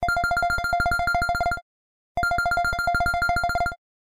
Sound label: Electronic Alarm; KONKA; alarms; SP6